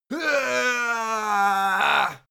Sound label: goblin die